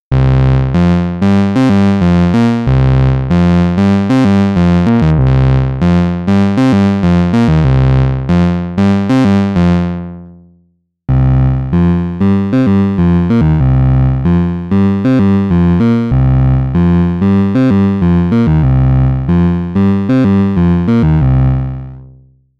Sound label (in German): TriSaw Bass später mit ADSR Out VCA In